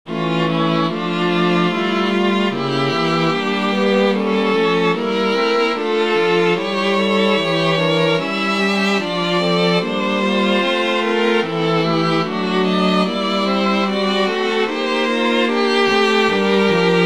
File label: Chorale in C-sharp minor for String Quartet (transposed a minor 2nd above)